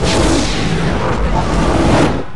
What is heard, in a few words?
gate Open; minetest; stargate